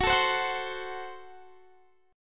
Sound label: F sharp Diminished F sharp, Guitar Standard; strummed; x,21,19,17,19,20